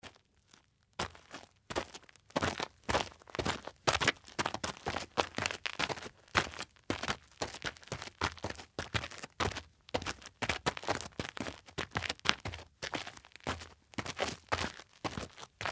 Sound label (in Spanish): caminar sobre barro